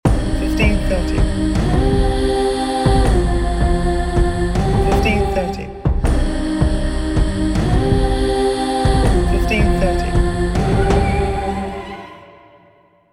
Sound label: Screecher